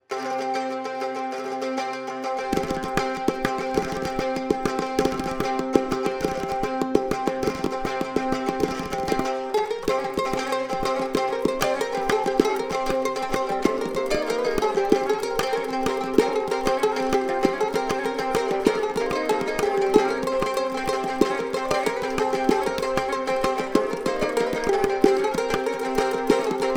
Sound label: Pandura; Tanagra